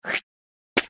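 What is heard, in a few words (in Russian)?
zvuki plevka cheloveka, human spitting sounds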